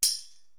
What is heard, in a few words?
Tambourine; ZERO G - Datafile DISC 1